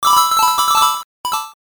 coin shower

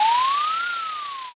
drift

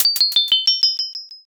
cracker

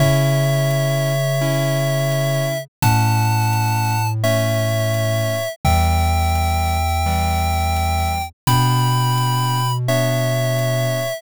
bgm mellow

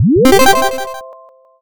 boot

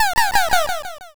witch laugh

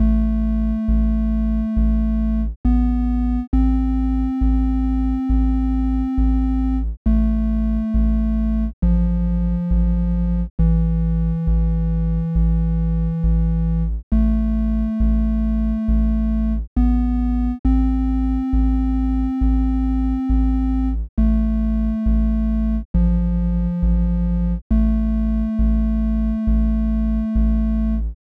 bgm

